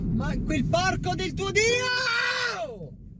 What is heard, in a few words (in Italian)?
clacson